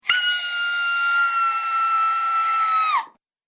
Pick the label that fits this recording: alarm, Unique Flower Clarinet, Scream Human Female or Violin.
Scream Human Female